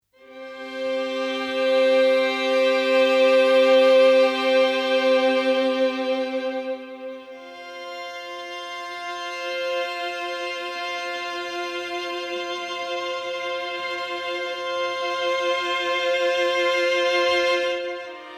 violin sustain

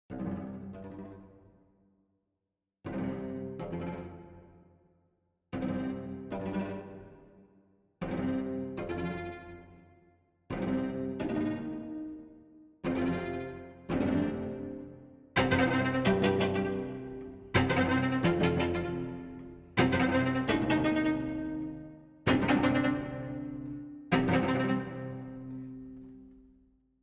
Cello ricochet

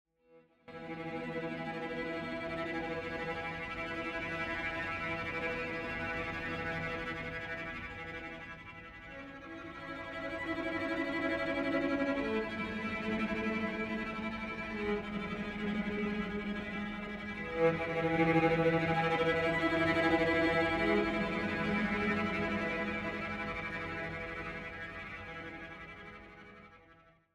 Cello delicate trem

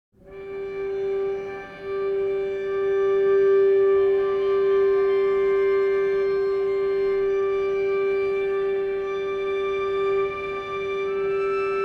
Cello harmonics

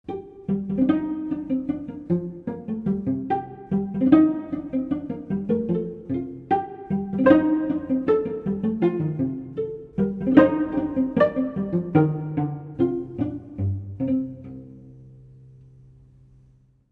Cello pizz

